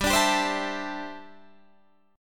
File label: D 9th Suspended 4th, chord